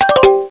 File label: Big Button; bigtop; kitty2